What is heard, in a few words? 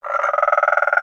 Pets Tortoise Happy; creatures pets; Lego